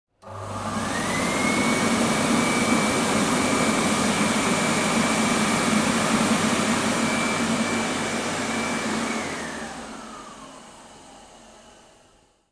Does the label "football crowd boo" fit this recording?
No